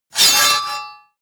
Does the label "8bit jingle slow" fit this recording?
No